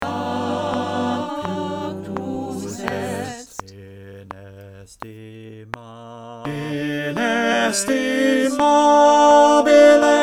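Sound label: tenor click, choir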